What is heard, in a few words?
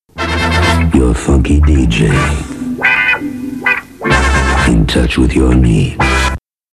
jingles, funky DJ